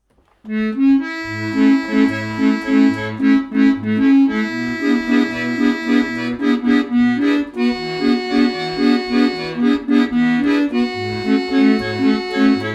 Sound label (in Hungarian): organette hangu; ariston; paurehrlich; lipcse; verkli